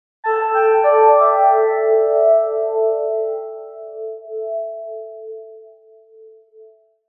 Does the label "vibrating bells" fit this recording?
Yes